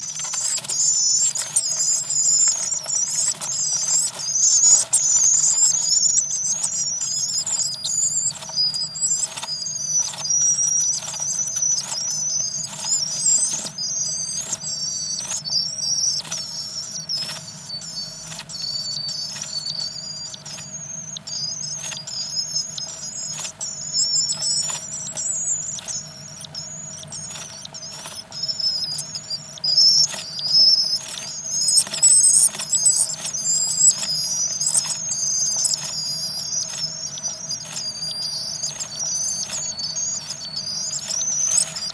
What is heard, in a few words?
swifts